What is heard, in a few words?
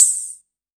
B2, synth line; 90 s Sample CDs; NiceBeats; SynthLine VOL-3